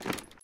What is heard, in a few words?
taunt equipment jingle; video games; source engine; tf